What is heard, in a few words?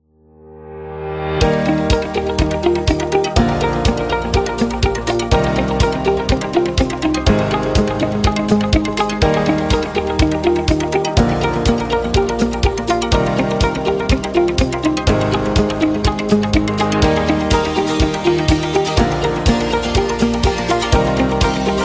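Happy Promo, Upbeat and happy background music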